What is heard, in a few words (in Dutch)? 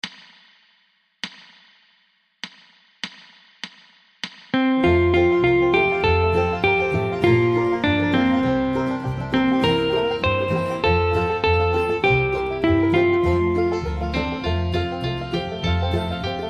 Liedje Mooie sneeuwbal instrumentaal; Peuterplein; prentenboeken